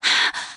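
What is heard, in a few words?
moan, female; Interactions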